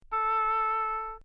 oboe A4 mezzo-forte normal

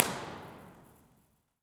Underpass; JFK; Impulse Response; EchoThief Impulse Response Library

